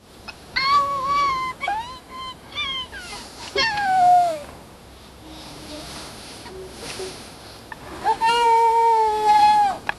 rustling paper sparkle; symbols

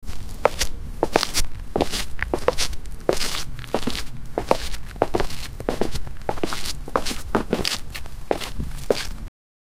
footstep on the ground

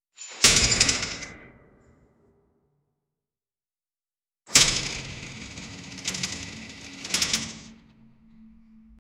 hydraulic latches disenga, latch

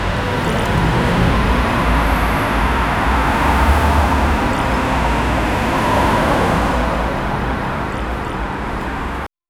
a city traffic ambience

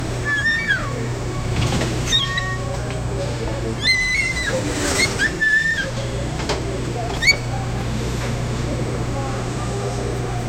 ambience inside a large store

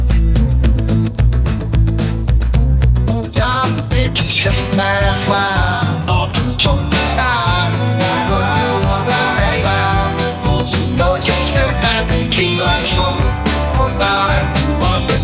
folk pop

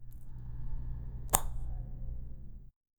a short sound of an, appearance

